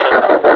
laugh, combine suppressor

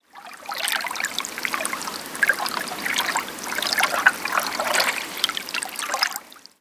ambience canals water lap; Counter Strike Model Pack; CS:GO

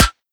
metal prop, bullet impacts; Half Life Model Pack

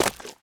mud, footsteps

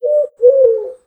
idle coo, pigeon; critters

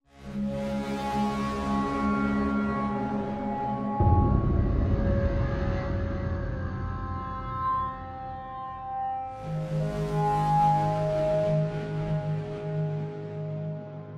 drainage gascans, music

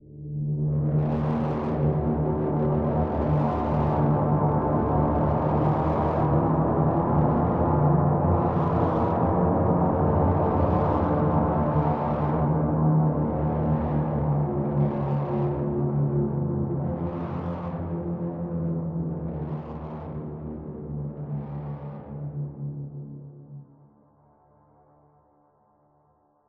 intro strider, music; Half Life Alyx

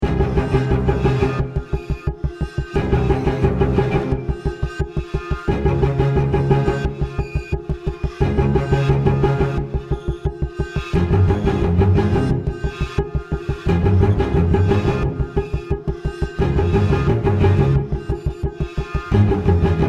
bomb planted

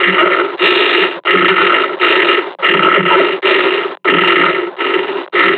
panting, combine charger; Half Life Alyx

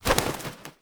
wings jump, crow; critters

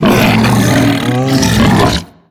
spit build, barnacle creatures; Half Life Alyx

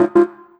inv invalid, player, inventory

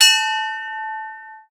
door bell; Half Life Alyx; vorthideout

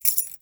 jingle, metal keyfob; physics; props

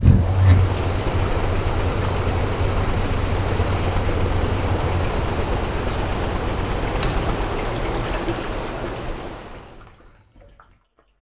water pump, ambient; Counter Strike Model Pack; CS:GO; nuke

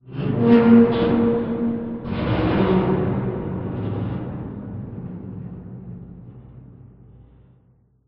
amb apocalypse wronk, ambient city

